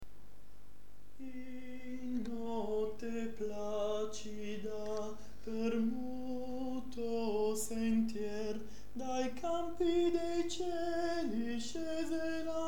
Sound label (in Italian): Tenori; In notte placida